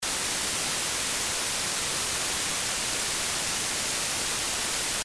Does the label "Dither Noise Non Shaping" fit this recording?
Yes